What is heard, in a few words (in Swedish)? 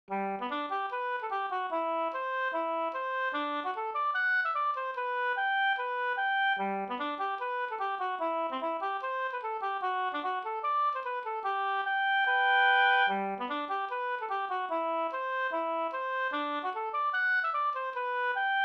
schottis; av Janne Kranz